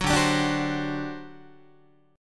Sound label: D 11th D, down, Guitar; D11 strummed; tuning 4A,4E,5A,5D,5G#,6C#